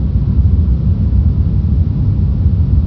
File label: RUMBLE; VERTIGO